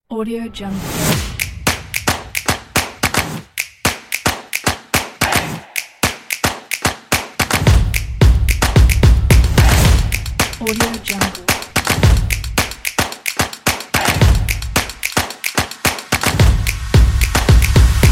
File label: stomp